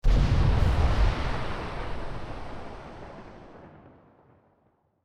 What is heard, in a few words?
blizzard start; Hollow Knight